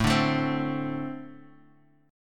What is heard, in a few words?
A Minor Double Flat 5th, Guitar Standard; Ambb5 strummed; 5,5,7,5,x,x; JGuitar